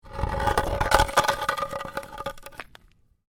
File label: Ice: drag large block of ice short distance; Snow